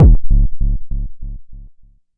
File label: Jomox MBase, drum machine; Jomox MBase 01